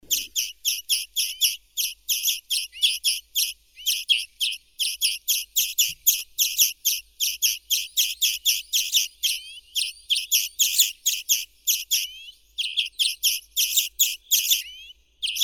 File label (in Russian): zelenushka obiknovennaya chloris chloris